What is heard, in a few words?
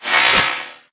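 Drone Movement Turn; Titanfall 2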